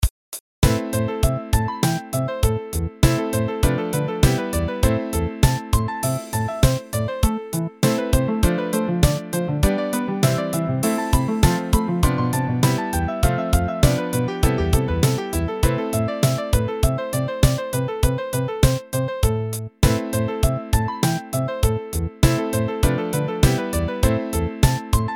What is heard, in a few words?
music generated; MusicRx-C; Badinerie